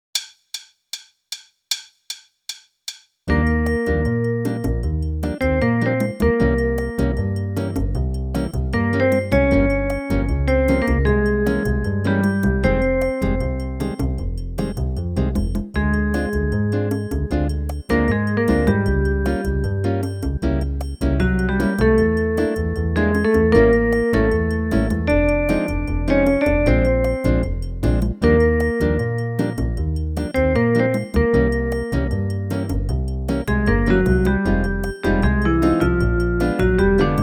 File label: Bossa Nova; Meespeel CD